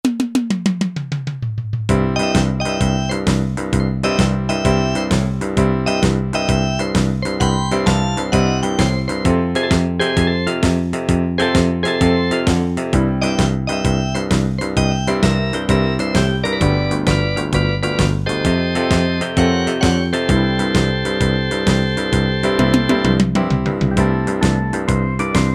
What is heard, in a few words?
shuffle, Yamaha PSR-300 styles